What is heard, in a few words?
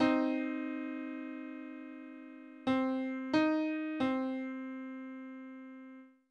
Minor third on C